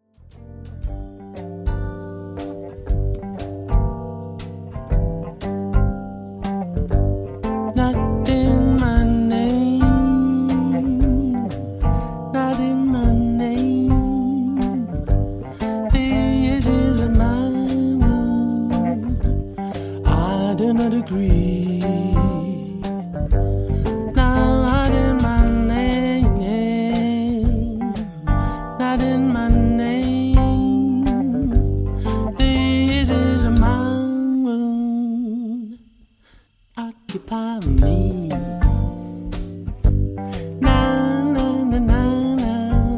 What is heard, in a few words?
Talking Blues